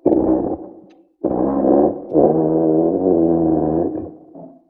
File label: monster farts; Animals